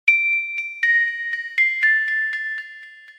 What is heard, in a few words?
Sweet N Soft Sms